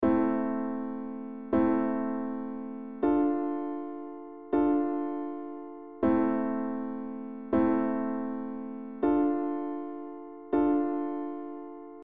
Im Im IVm IVm, Progression Accords

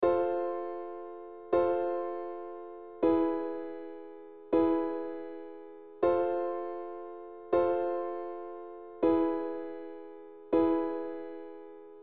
VI VI V V; Les bases harmonie; Progression Accords

